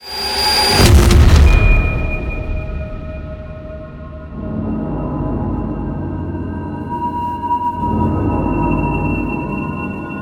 ending; Doom; VEGA Core

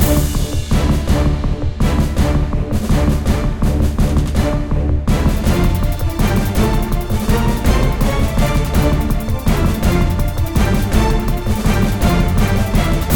Main menu Music; Choplifter HD

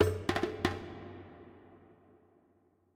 chimes drums; gamerip; Heretic Operative

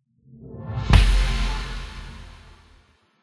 Victory Sound; Tuebor: I Will Defend

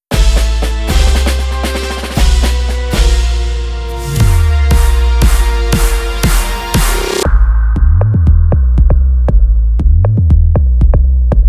Instrumental; Calling All Bbs

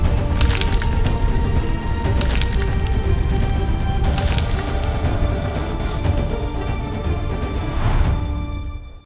ending win

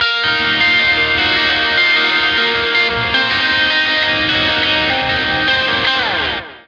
Lake Hi Gtr E flat 9

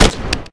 Splatter Explode; blastmorph pinball; xbox series xs

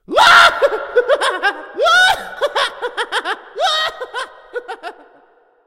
racoon laugh

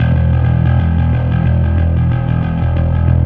Fuzz E1; torchlight iii; ps4

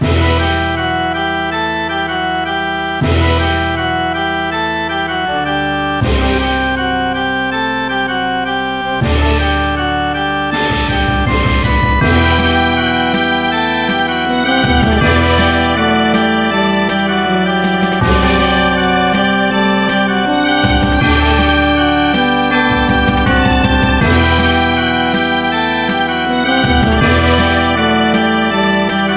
Demons Pursuit

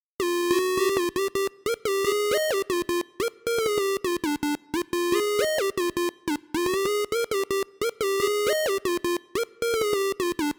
Melody; chippy

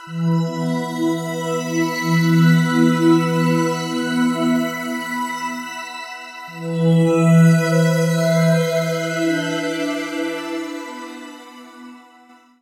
Forest FM Synth; torchlight iii; ps4

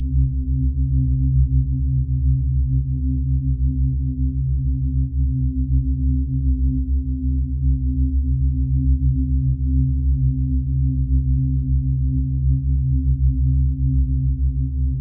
time stop loop; badland; ios gamerip 2013

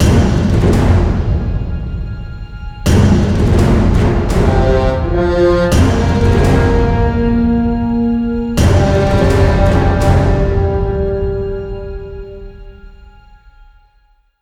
Success

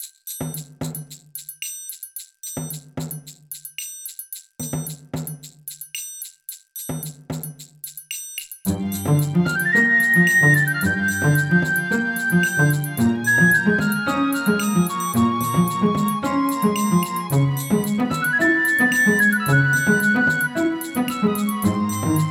Jungle

